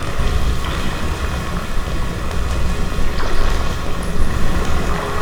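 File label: ion shield loop; weapons; dota; dark seer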